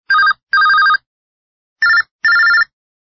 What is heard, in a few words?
Ring; LG KW838; Simple